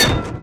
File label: metal hit; Bad Piggies